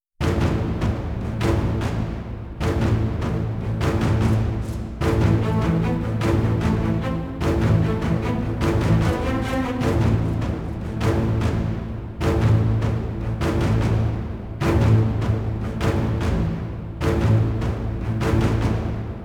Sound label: invictus non choir